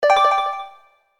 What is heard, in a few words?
System Accent